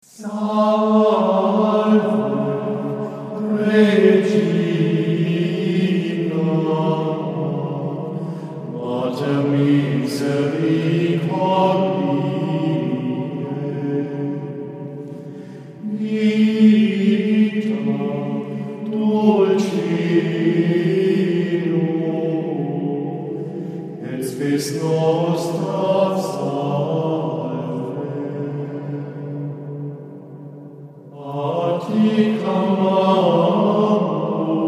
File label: Antiphon, schola; Salve Regina; o clemens o pia